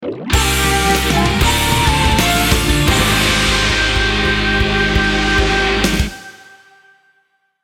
bgm game over; wii gamerip; naruto shippuden clash of ninja revolution iii

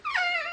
Coffin Close; Undertaker's

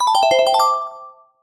SFX UI Quit Race; Mario Kart 8

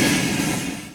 Flame

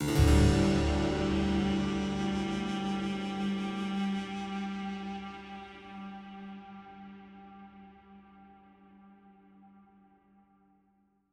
Stingers sting neutral

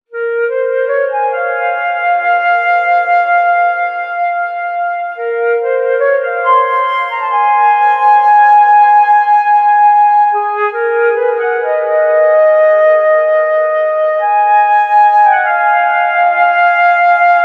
flute; oceanhorn monster of uncharted seas

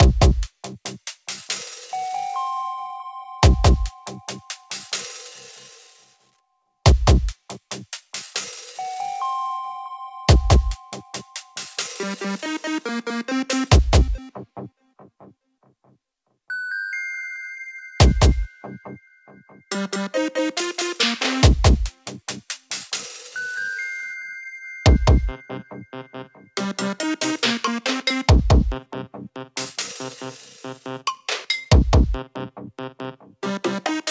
Menu Loop; Sneak Ops; Android

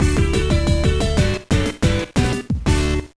Jingle, gba; Backyard Baseball 2007

